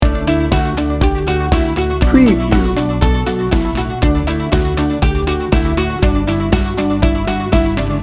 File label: happy glow upbeat positive loop